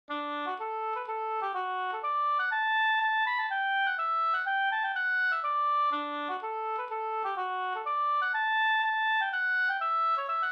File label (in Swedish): polska brudpolska; Adelso